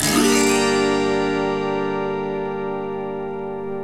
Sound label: AUTOHARP; OMI - Universe of Sounds